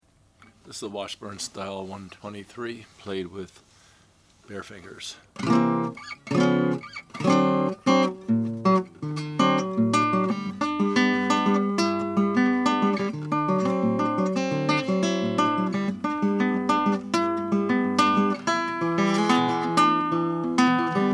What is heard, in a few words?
washburn new model natural